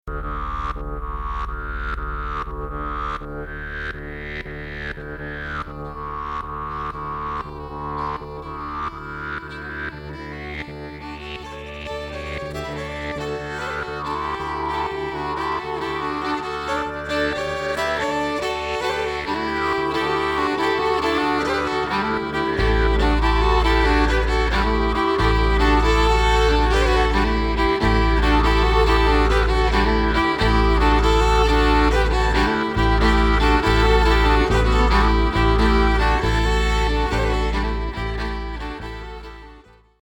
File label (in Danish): hvirvelvalsen